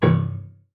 Piano String Hit Short

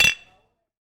Prosit Glass Bottles

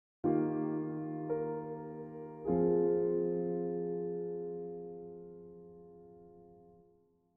Piano mood melancholy

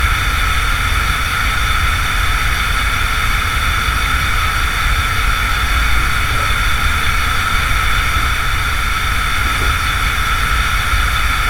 Gas Loop

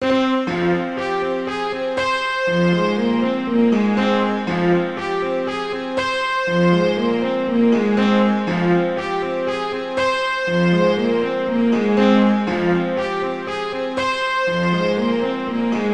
Piano And Strings Loop

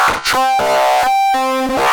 Module Delay Loop

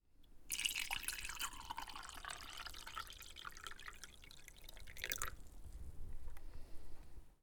Pouring Water Into A Mug

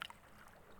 Drip

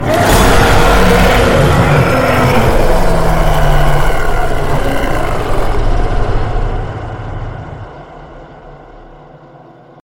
Monster Roar; Cthulu

